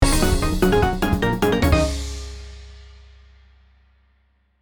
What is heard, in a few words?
feedback good result; effect; success